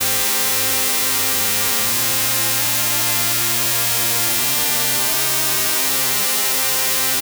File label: full strings white